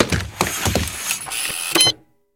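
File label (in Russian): неясный шум работающего аппарата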